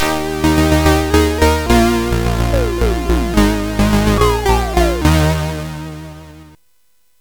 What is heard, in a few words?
Quartet, CSG8580R5